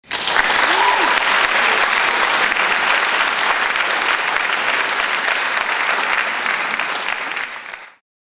applause

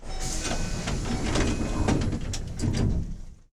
metro door close

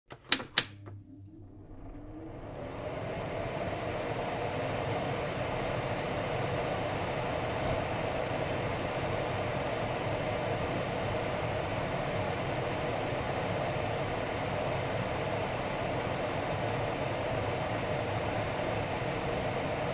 kitchen exhaust

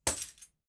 keys drop

